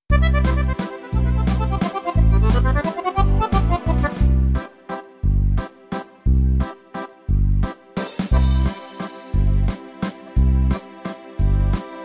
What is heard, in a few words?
VALZE